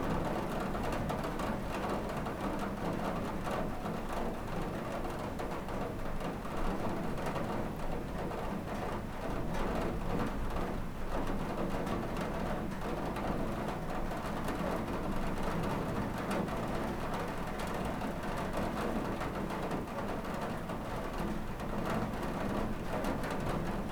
rain window; 99Sounds; Rain And Thunder

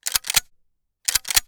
Shotgun Quick Pump, 12 Gauge Shotgun; TS Sound

